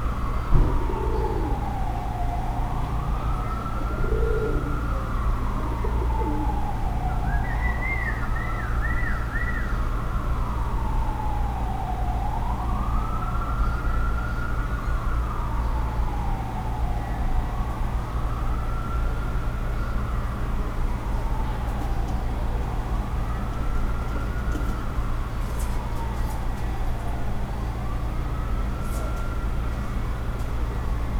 city ringdove with huma whistle and car siren sound; animals